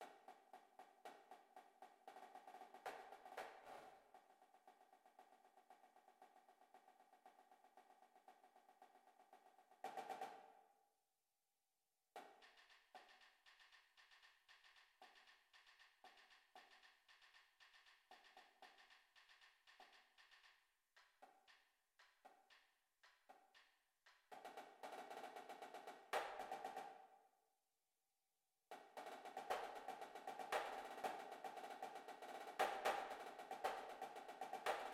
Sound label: cadence snare, drumline